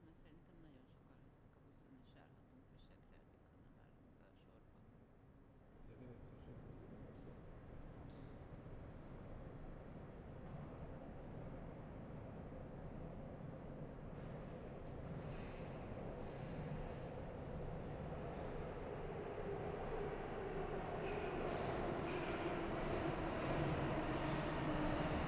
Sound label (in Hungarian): jobbról érkező utána léghuzat, metró; varoskapu